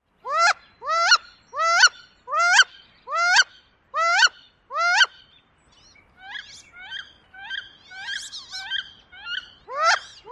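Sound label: female ooeeks and male calls, Wood Duck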